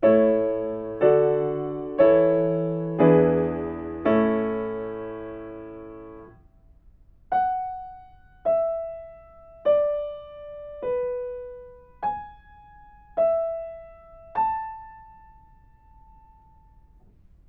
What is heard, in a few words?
melodic fragments